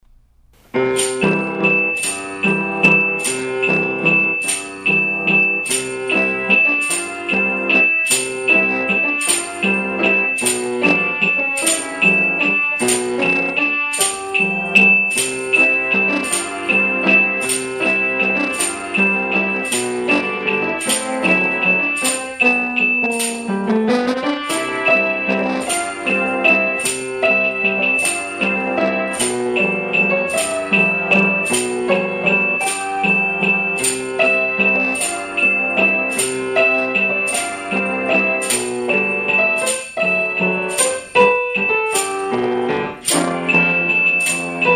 Waltz, Arr Duet; Swan Lake

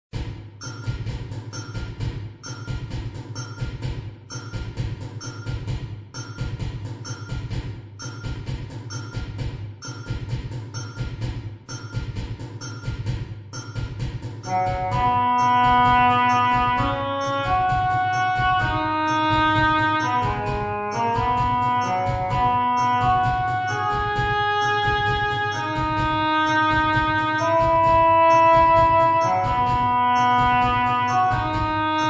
Percussion and Voice Midi

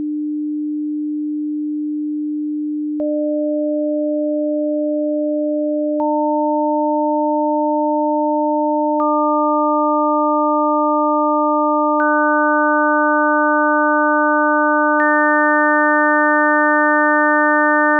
300 Hz to 6 partials, cumulatively

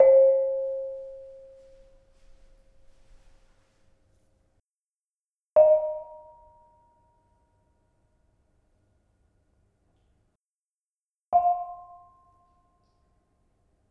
Bonang Panerus Slendro Tones in Ascending Order

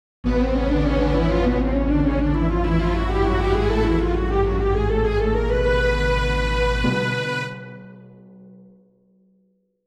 Audio Sibelius Playback; Before

